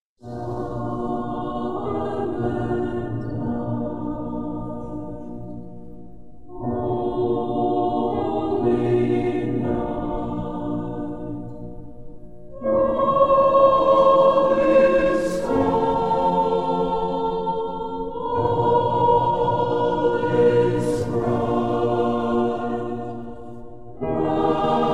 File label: Christmas Choir; Silent Night; USC Pantheon Choir -Pgh